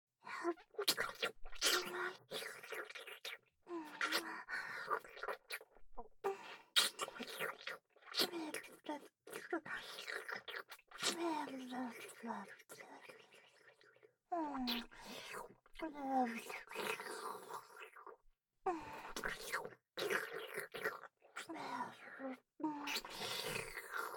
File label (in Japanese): ひたすらペロペロトリプル舐めフェラ; ユキと梅雨子と霧江